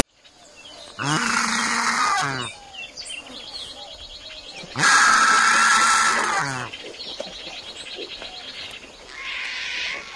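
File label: Turkey Vulture hissing grunts, Mexico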